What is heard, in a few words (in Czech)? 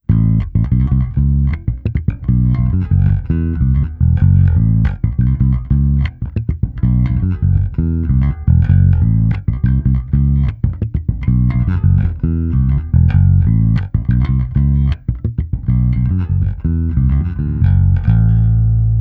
Hnědá se simulací aparátu, zkreslení, hru slapem; Xotic XJ-1T